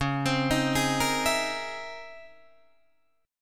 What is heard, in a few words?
C sharp Minor Major 13th C sharp Guitar DADGAD; C#mM13; arpeggiated; 11,15,13,13,13,14